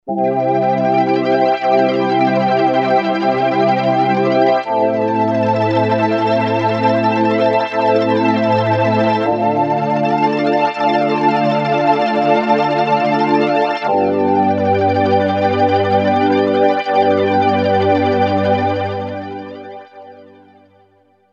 korg m1r synth

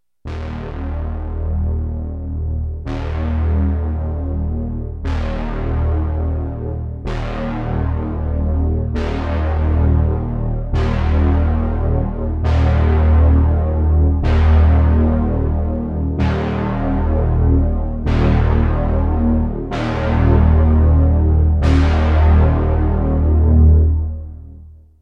stacking voice unison, synth access virus

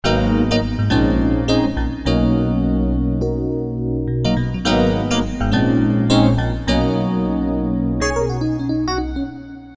piano, yamaha sy99 synth; Piano: DX tines

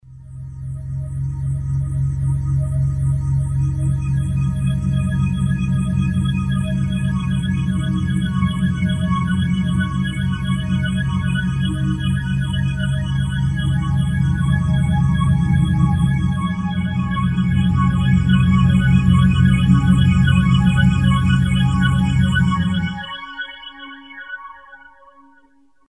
pad, roland jv2080; Pad: slowww

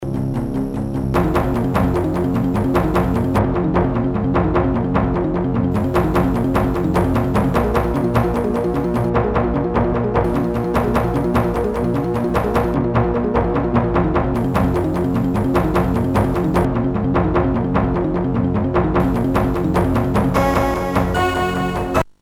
filter; Commodore Amiga

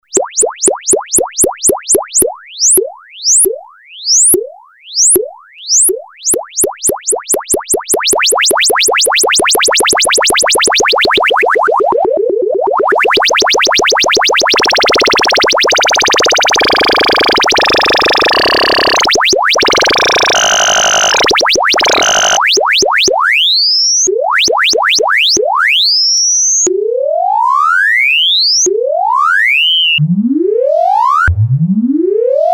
lfo, Electrix Filter Factory

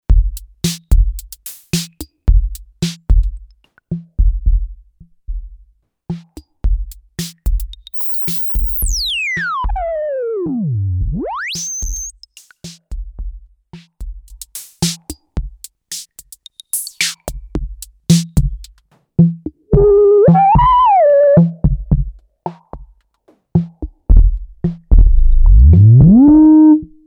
loop filter, korg ms20 synth; filtering external loop

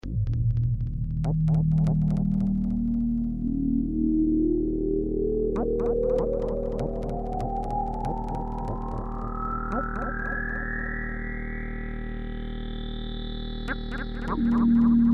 pad filter, yamaha sy55

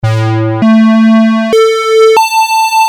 square, korg ex800, synth

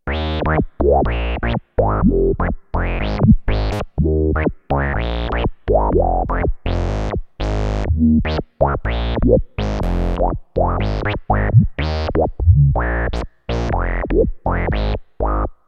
bass analog, yamaha sy99 synth

